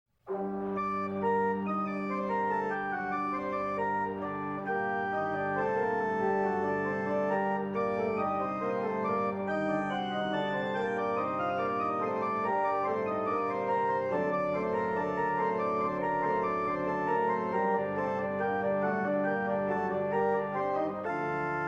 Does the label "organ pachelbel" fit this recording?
Yes